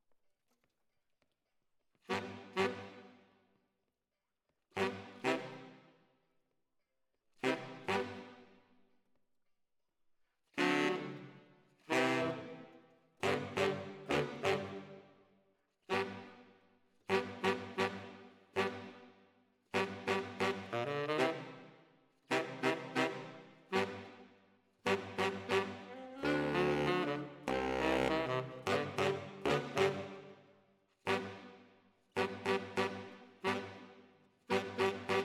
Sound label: ORTF SCHOEPS, piano